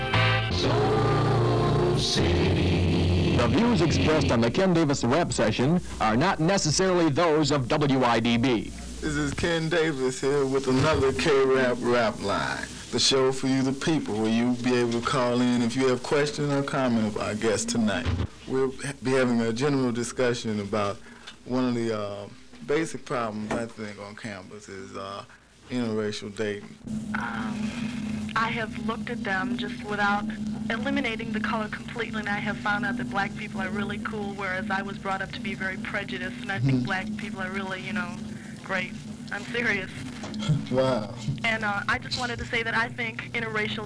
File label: Rap